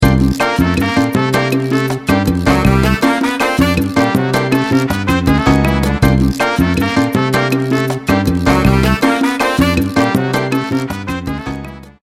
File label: MC-909 cha cha